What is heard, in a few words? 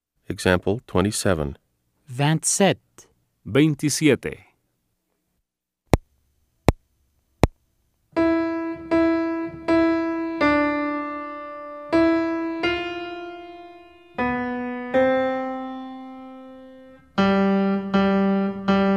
Keyboard